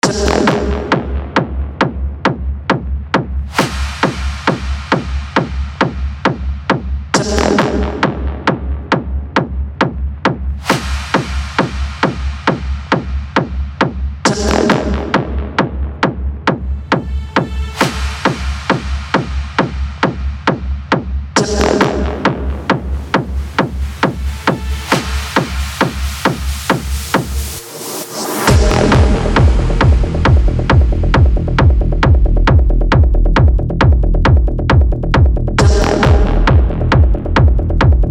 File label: Techno